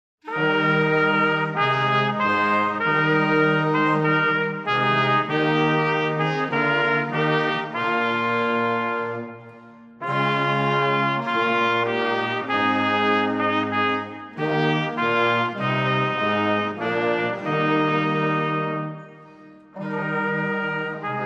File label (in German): Intrada Bläserensemble